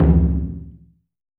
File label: oo daiko, taiko; AKAI S6000 CD-ROM - Volume 5